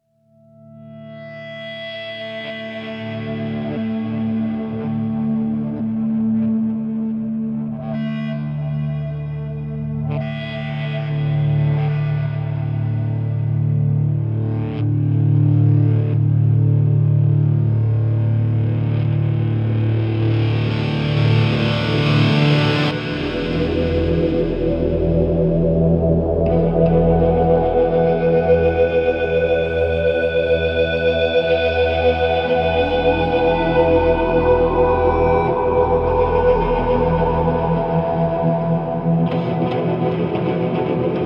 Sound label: Electric Guitar Ambience; Half-Life